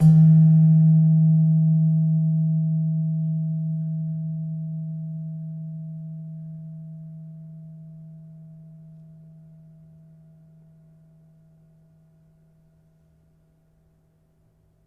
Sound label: Slentem, gamelan